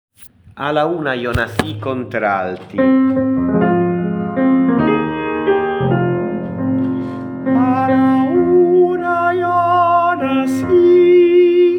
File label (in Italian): CONTRALTI